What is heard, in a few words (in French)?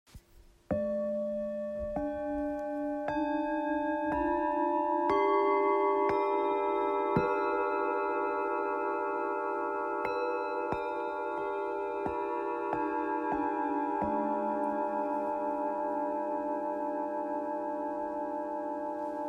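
Set 7 bols sensoriel, bol chantant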